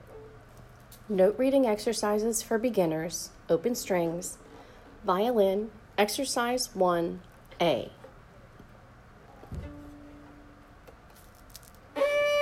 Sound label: open strings violin